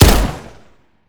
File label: fire sup; 12ga